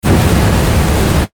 Flame Booster Short, Burst; Spacecraft; BlastWave

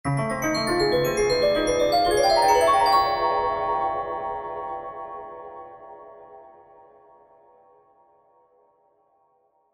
Piano Dark Eerie, Piano Dark Eerie Glockenspiel; Musical; Pianos; Blastwave; Sound Effects:Musical

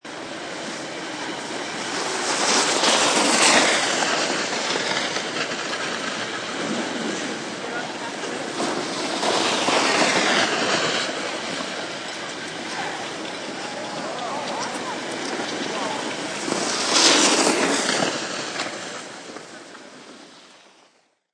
Three Skiiers Ski By, Skiiers ski by; Ambience of ski slope; Skiing and Snowboarding; Sports

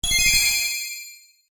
UI Confirm, User interface feedback sounds; Computers; Electronics

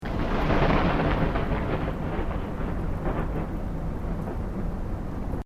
THUNDER DISTANT STEREO

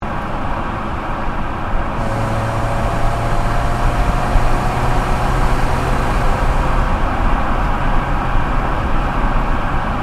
Car Ford Focus Cruise Exhaust; Ford Focus hire car; Cars; Vehicles; SoundEffects-Foundation1

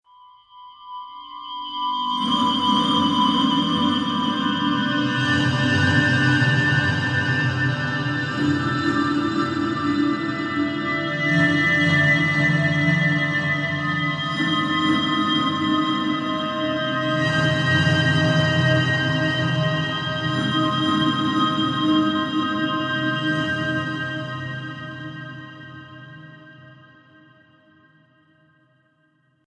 Dimensions Future Vortex, Sci Fi Music

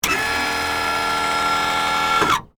Jeep Winch Up

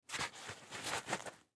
Hockey Glove On, Hockey Glove Slip On; Sports

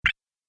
Interface Sound, electrical button

